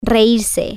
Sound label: laugh